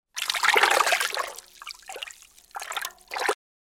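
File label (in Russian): всплески пойманной рыбы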